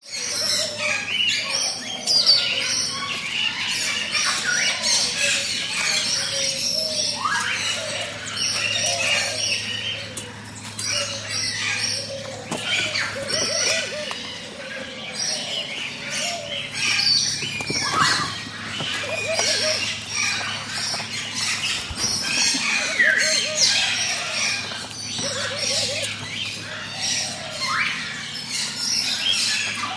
birds tropical large avery feeding parrots parakeets doves and others; zapsplat